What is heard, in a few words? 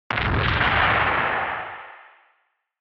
Retro Anime Robot Low Short